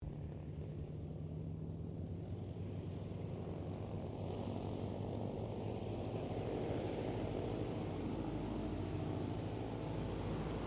AIRCRAFT LOCKHEED HUDSON PREFLIGHT DISTANT, Hudson twin engine light bomber; 1941; Airplanes; Vehicles